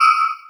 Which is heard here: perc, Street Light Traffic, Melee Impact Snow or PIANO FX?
perc